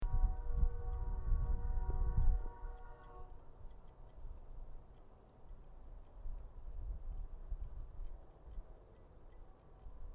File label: horn